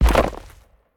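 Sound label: large step; Smite; Cabrakan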